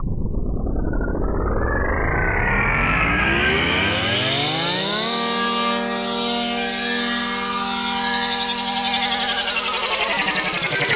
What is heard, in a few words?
alarm; Masterbits - Tekkno Trax; MACHINES+FX